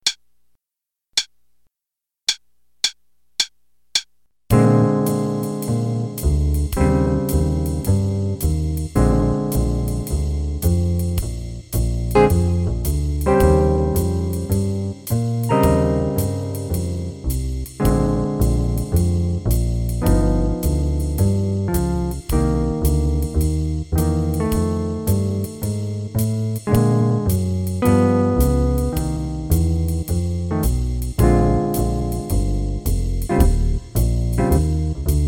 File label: Play a long Blues in Bb qn=108 w gtr